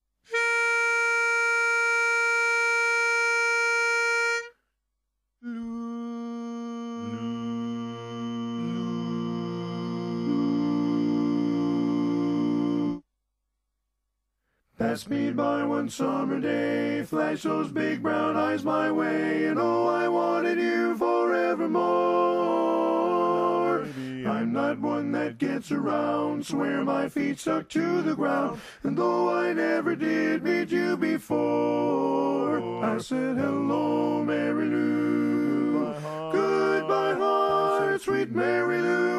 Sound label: Hello Tenor